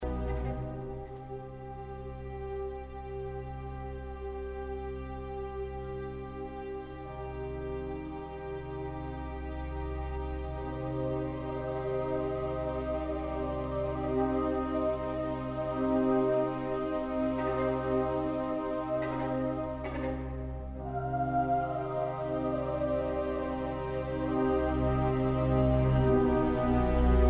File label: loop